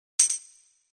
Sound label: tambourine; UISound